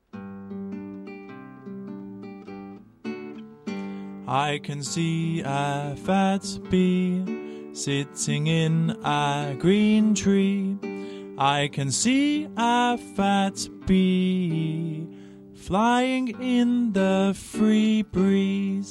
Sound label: song; a fat bee